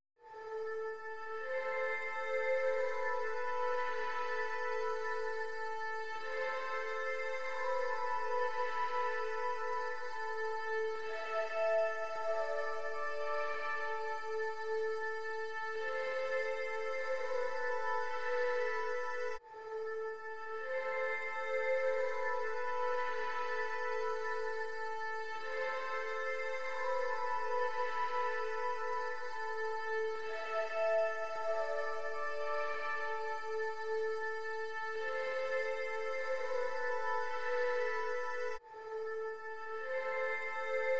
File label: Strings And Pad